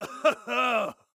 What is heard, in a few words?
Laugh; Viktor; Paladins